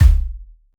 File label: impact deep